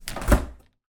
refrigerator door open rubber seals sticky, household